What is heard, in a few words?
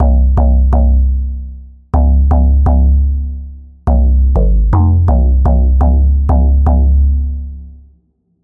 Emotive FM, bass synth; Preset: Emotive FM; Wave Alchemy - Bassynth 1.1.0